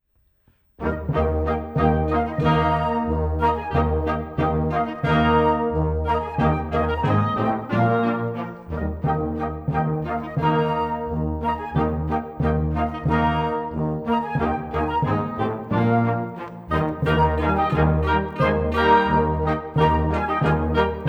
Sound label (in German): Vivaldi ohne click